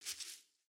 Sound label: Foot Grass Walk, footsteps